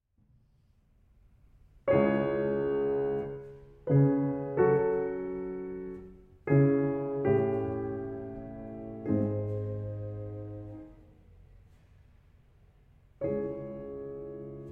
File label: Nocturne in C-Sharp Minor; B. 49